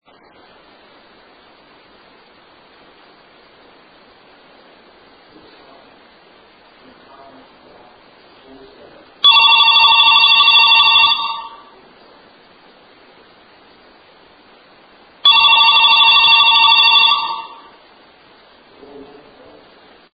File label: Court House PHONE